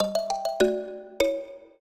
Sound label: trio, mbira; Mozart